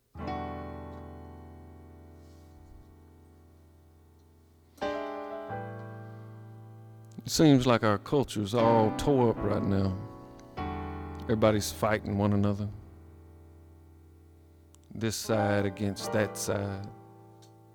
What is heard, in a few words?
Noise Live